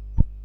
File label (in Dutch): zeepbel